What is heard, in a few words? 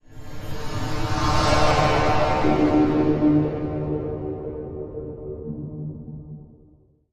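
Whoosh Harmonized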